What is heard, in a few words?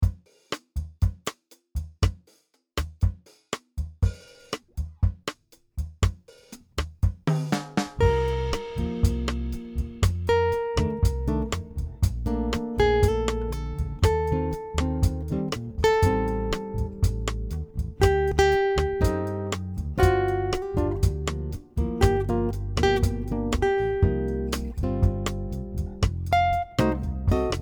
Solo, guitar impro